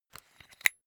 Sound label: umbrella telescopic extend